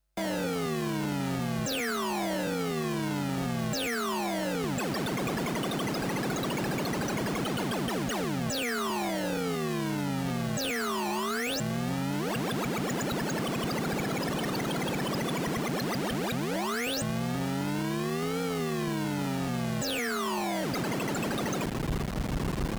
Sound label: AY3 Osc LFO Types